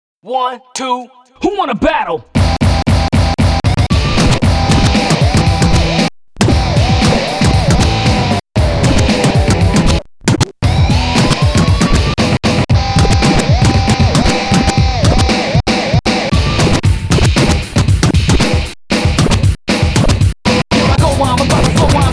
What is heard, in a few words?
rumble storm (scratching); crackin dj